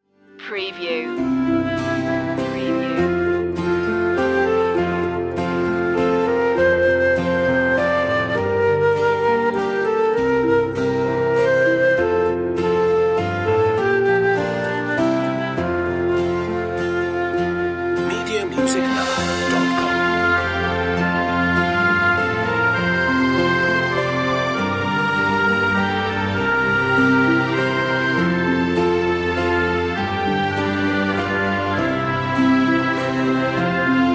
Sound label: orchestral; away in a manger; media music now